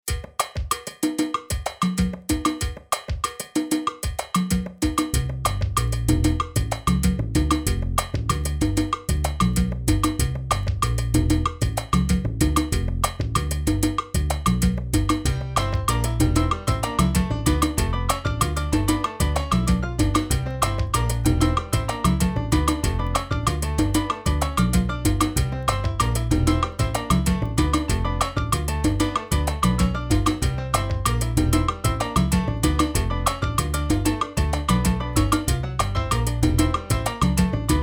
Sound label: bass